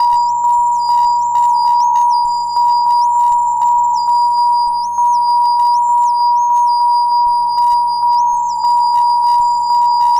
AMB BELL; AMG - Global Trance Mission VOL-2

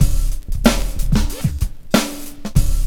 BEAT, Hip Hop; E-MU Formula 4000 Series Vol. 1; Hip Hop Nation; Beats 91-94bpm

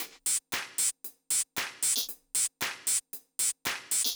Drumloop 115bpm, Nu Disco; Zero-G

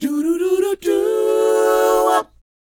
DOWOP, Vocal Planet R&B & Dance; ILIO

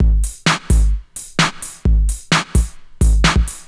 drum 130 electro, drumloops

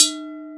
Almglocken Low ff; Digital Sound Factory Virtuoso

